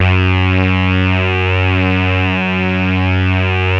SAW RAVE, LEADS

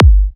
Kick G

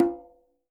quinto; Sampleheads; NYC Percussionworks

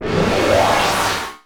TIN BLAST, Trance Fusion, STOP SHORT; ILIO

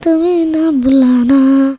CHILD, Indian Traditions; USB Soundscan vol.45; AKAI

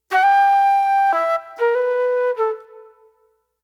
Soul Plate Flute

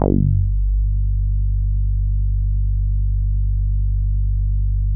Synths BASS

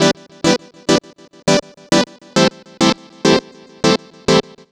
Synth Stabs; Trip-Hop; Loops; Sonic Foundry (Sony Creative Software); Rondo Brothers Trip-Hop Loops & Samples; Liberation

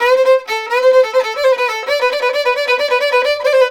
VIOLIN, Balkanish Traditions

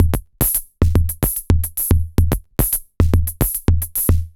retro drum machine Loops 110bpm; Tape A; SR88

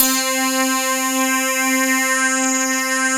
FNK TOUCH C4, CLAVI FUN, Synth

